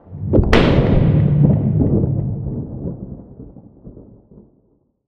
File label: thunderclap, cantrips